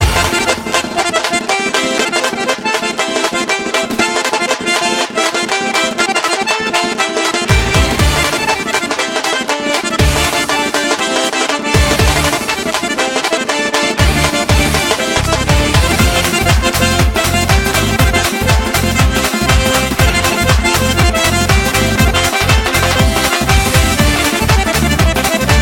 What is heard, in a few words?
Lezginka Kavkaz